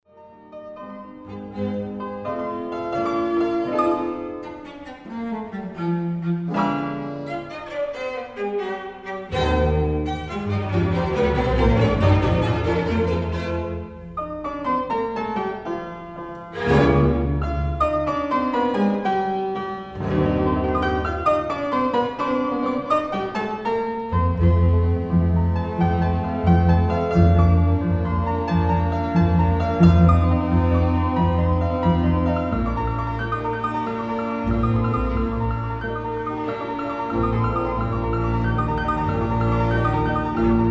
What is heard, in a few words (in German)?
Klaviersextett; 3 Satz